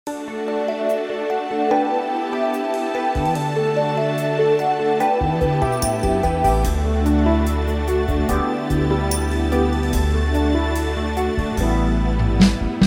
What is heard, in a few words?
beat